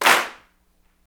CLAPS, Africa Handclaps; Roland LCDP14 Africa VOL-2; Afr. Hands

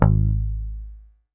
MUTE G#1, Bass; Sampleheads; Will Lee Bass Library

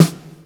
CHUBBY POP, SNARES; InVision Interactive; Lightware VOL-1

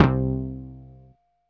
synth JX 1, dance synth; Sound & Vision - Gigapack I CD 2 (Roland)

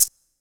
MARACAS, HR-16 Drum Mashines; EdgeSounds

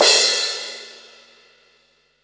crash R; Zero-G; Chemical Beats; CRASH ZOO

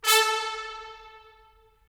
BRS, Tps Stacc; Roland - LA Composer vol. 4

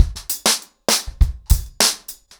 100BPM, ACOUSTIC DRUMS

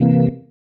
Stab One Shots, Organ

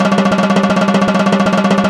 timbale, Yamaha TG-500

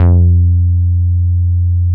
MOOG1 BASS F2

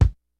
FUNK, DRUMS; Kid Nepro; Elite

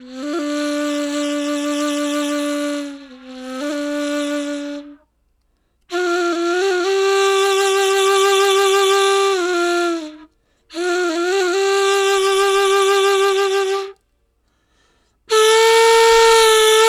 Ney, Wind Instruments; Sonic Foundry (Sony Creative Software); World Pop

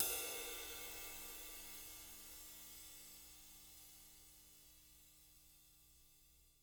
JZ RIDES, Living Drums

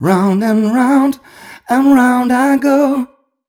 m 128 mel b-flat, Vocals

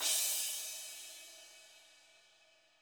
WARM FAT KIT; Spectrasonics - Burning Grooves CD2

